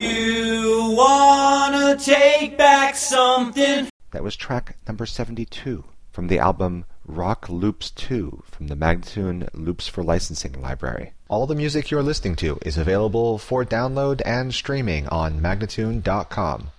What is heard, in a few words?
A spoken, Rock Loops; spinecar; Loops For Licensing; MagnaLoops